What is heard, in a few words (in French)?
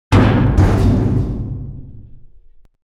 bidon large; nucleaire